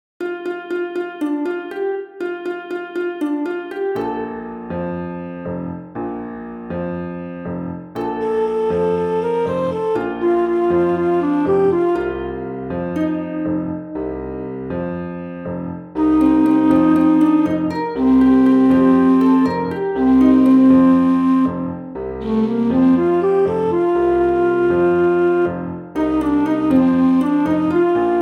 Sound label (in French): melodie